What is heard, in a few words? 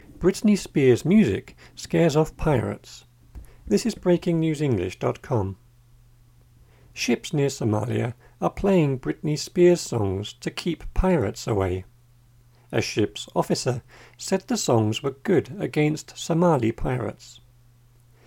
loud music